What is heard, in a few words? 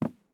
walk wood; Escape from Tarkov